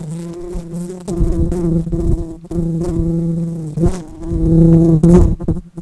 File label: Pascuorum workers, bumblebees